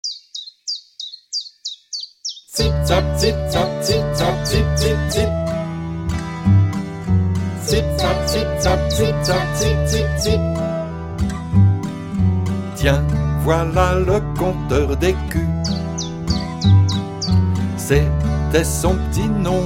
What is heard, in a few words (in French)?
Chanson Le compteur décus